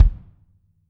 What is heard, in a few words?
R B Kick Close, drums, One Shots; Sonic Foundry; Sony Creative Software; Drums from the Big Room The Mixes; R B Kicks